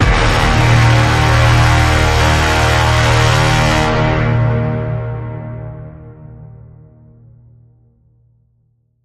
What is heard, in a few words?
Bend, D sharp